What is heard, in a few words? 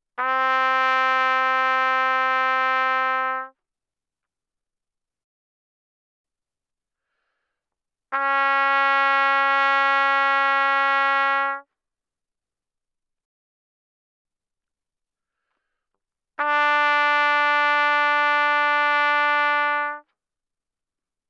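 Trumpet no vibrato mf C4 B4; Brass; Bbtrumpet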